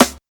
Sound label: original snare sound c key